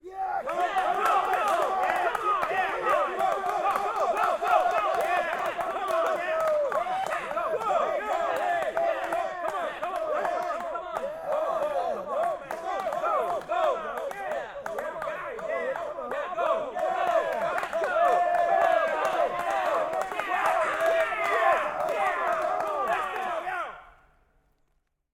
Audience Reactions - Small Crowd Men, Cheer Applause, Long, Decrescendo, Cresc, Small Crowds Outdoors; Crescendo, EN - ORTF; Game Audio Factory